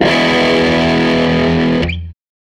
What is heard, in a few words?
SG Guitar Loop